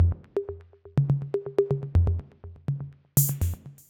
123BPM Perc Loop

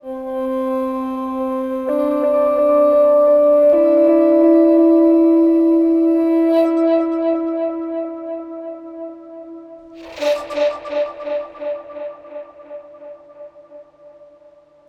Loop Pad Flute C 129; BackseatPeace

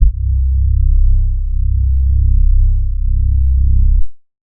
Bass; Dusk

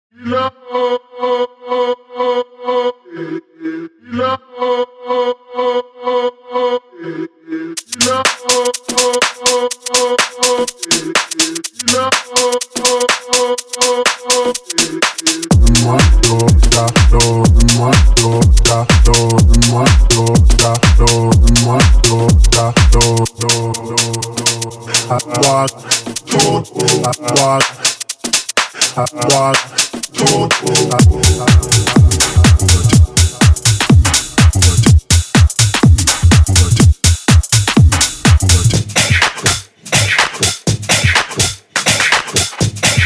Afro Tech Vocals

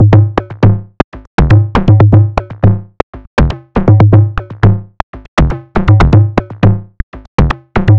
Analog Techno Sequence 120BPM Analog Machine

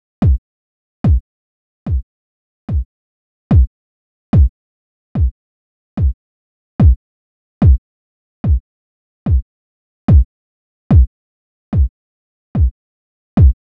kicks

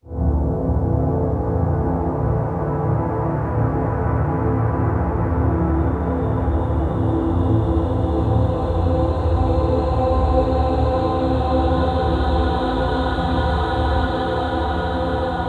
140 A Abstraction

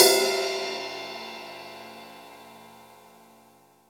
RIDE

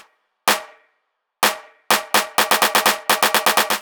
Snare Roll Loop 126

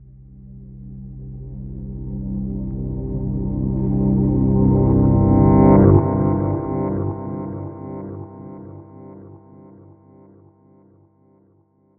Guitar FX 80BPM Gm

